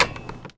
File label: Foley